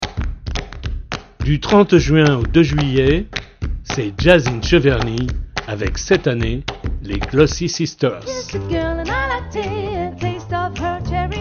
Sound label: jingle Jazzin